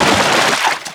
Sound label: swim